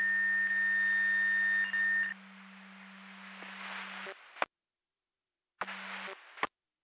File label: Siren; Hamburg FD